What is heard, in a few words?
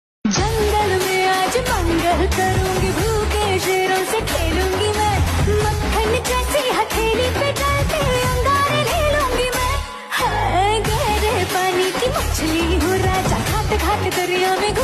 Ringtone